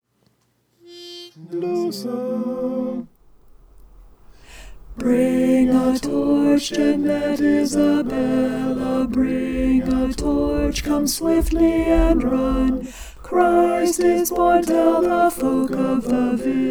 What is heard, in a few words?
alto